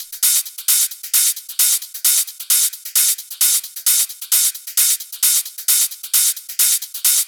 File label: 132BPM Elemental Kit